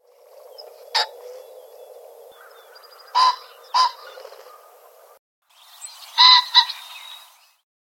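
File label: RNPH, Red-necked Phalarope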